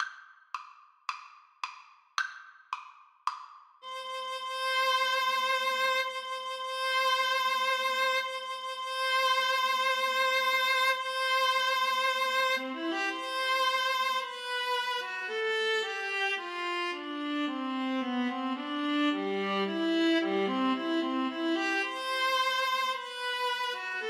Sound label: duet